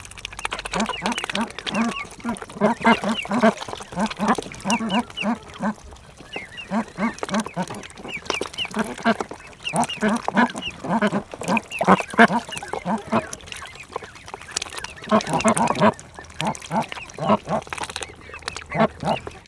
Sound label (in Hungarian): isznak a kiskacsák; állathangok; paraszt udvar